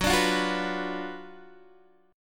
F sharp Major 7th Suspended 4th Sharp 5th F sharp, Guitar DADGAD; chordshape; down